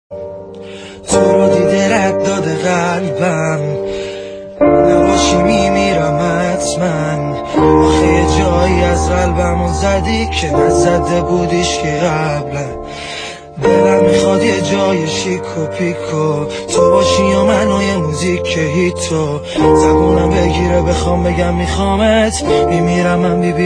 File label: guitar